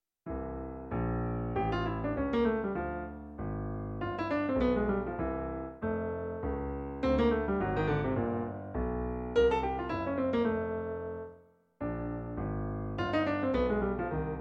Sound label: dominant, jazz piano